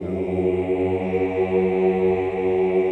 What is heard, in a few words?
CHO F#1, Ooh; Ooh + Ooh 2